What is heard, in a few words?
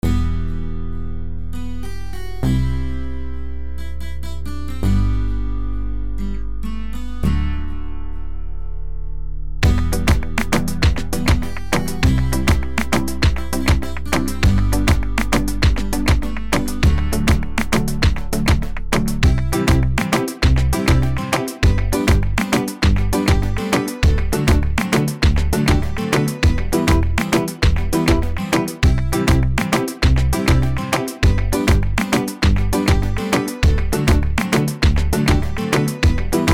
urban reggaeton sample kit